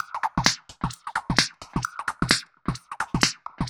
Beat filt 130bpm, uk garage

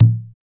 PER Percussion

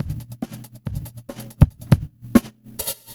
LOOP FX, Big Beat Drumloops

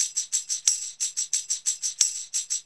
Hattentamb, Synchro Funk, Beats; Sonic Foundry (Sony Creative Software)

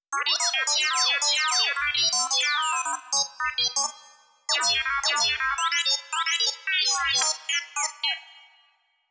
wipwop

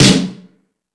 Sluggo Drums, E-MU Pro-Cussion

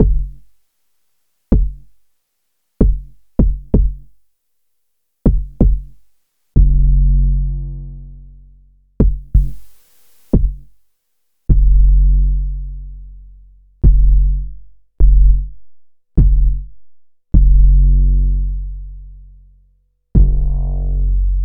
Bass High Quantity

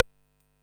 RIMSHOT, drum kit; Polyend; Stazma & Concrete Collage; Concrete-55

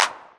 909 Clap, Novation Drumstation

